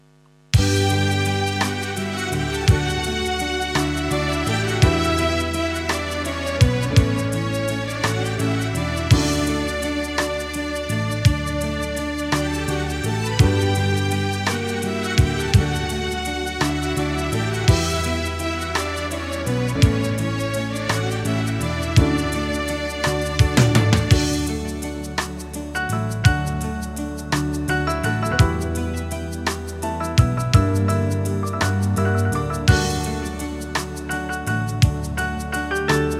Piano

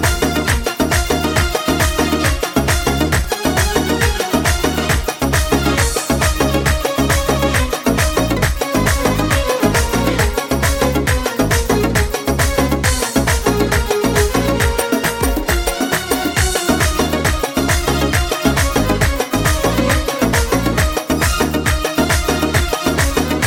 Melody

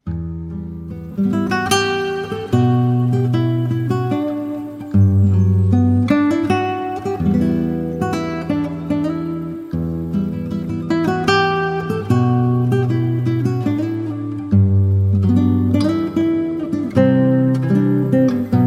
Guitar

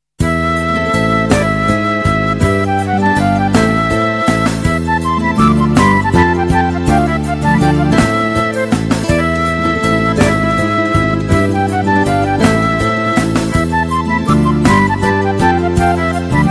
Guitar

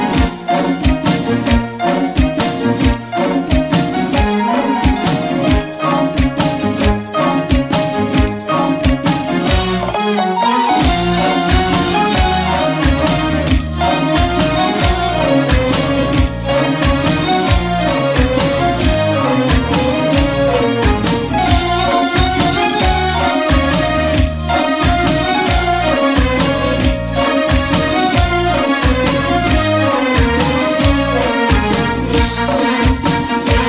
Violin

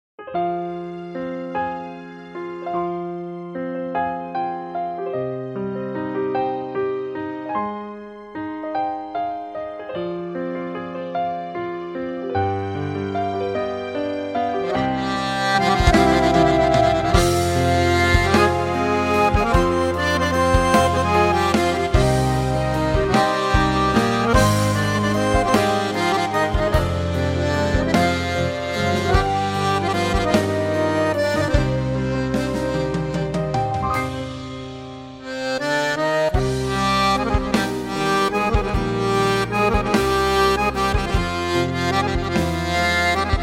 Accordion

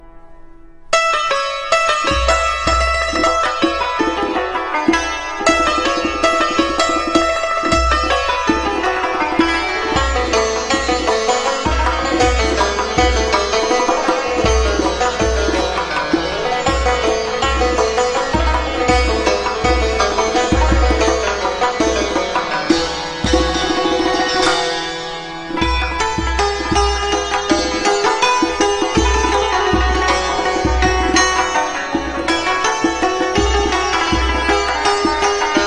Santur Tonbak, play back

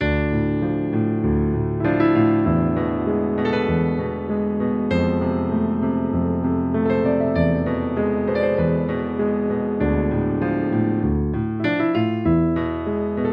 Piano

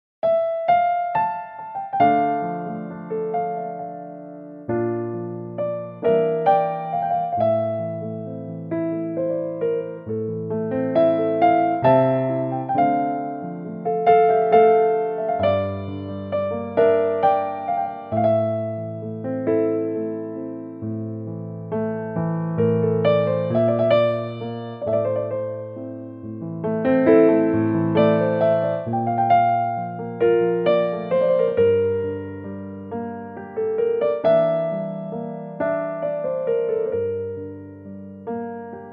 Piano

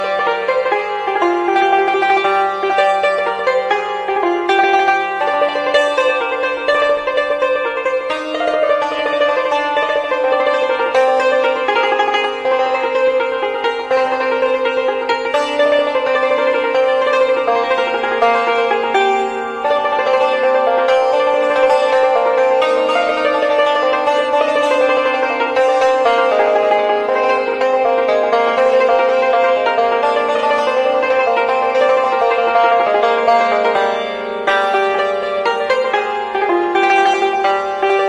Santur

